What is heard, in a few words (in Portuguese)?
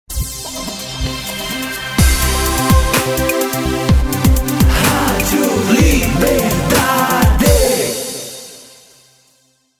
RADIO POP; vinhetas cantadas